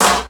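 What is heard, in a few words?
snare drum, Drum & Bass, 160 BPM; Ueberschall; Drum & Bass Resonance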